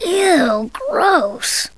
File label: eeew